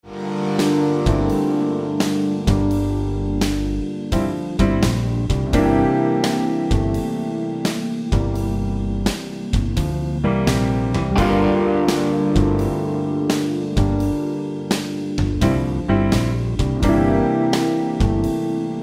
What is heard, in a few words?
Blues Backing Track in Gm; Night Owl Blues